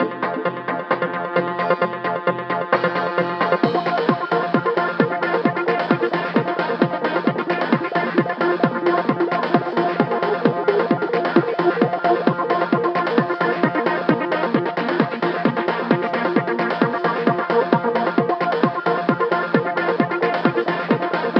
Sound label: BoomBox loop